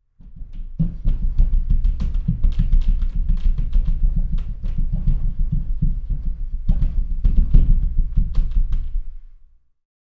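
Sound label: Footsteps Male Running, stairs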